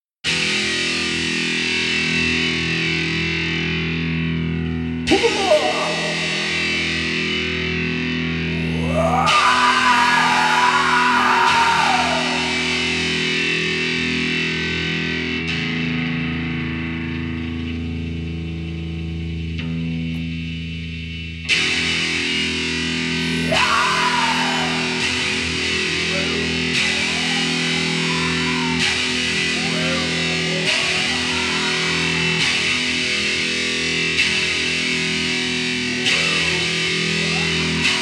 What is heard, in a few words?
Metal Vox Warmup